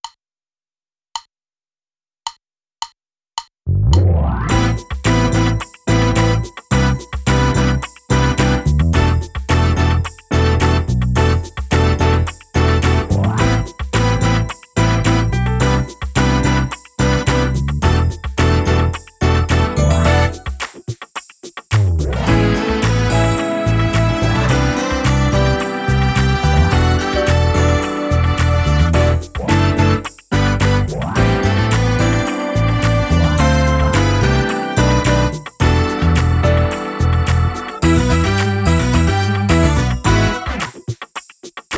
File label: Just Grooving drumless